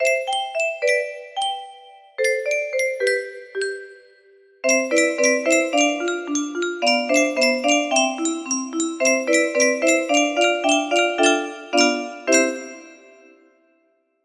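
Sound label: meow meow meow meow meow meow meow meow, music box melody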